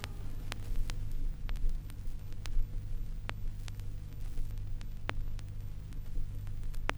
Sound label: Crackle, Vinyl Noise